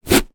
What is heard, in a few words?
foley shoelaces pull tighten; zapsplat